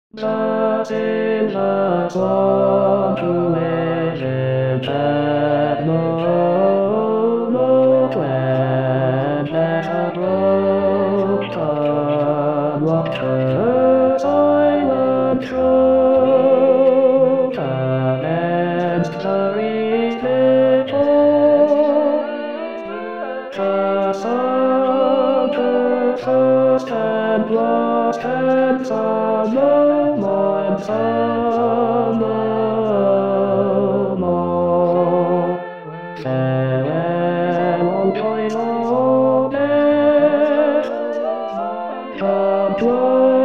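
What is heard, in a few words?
Silver Swan Tenor 2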